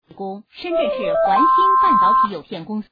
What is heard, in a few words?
Older Ring